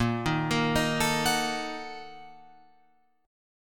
A sharp Major 7th Suspended 4th Sharp 5th, guitar, Open F; arpeggiated